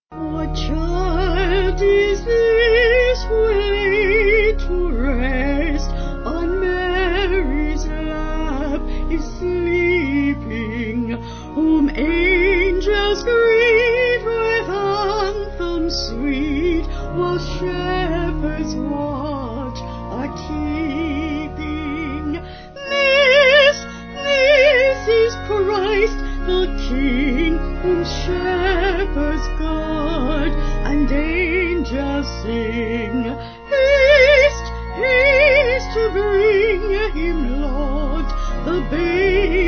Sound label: Vocals Organ